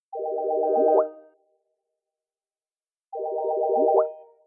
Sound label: Wobble